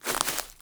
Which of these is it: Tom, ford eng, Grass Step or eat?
Grass Step